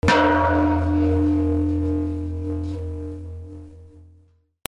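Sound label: percussion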